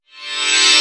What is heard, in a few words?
Sweeps Short